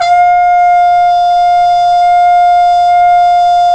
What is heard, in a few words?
BRS CPM TP, Cup Mute Dry